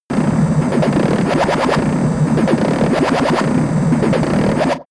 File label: space alien machinery working